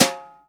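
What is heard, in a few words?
snare Brush, EMU Orbit-3 drum machine; 300 Drum Machines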